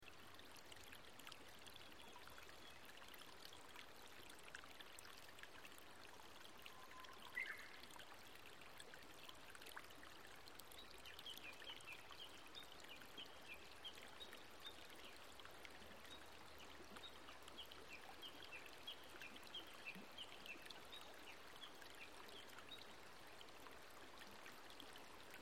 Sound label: Ambient Soundscape, Sound of the Earth; St.GIGA